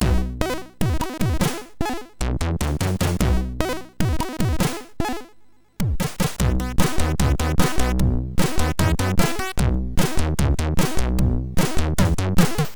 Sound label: Jakkie Jam, MOS6581R3